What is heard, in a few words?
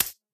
click; クリック04 クリック。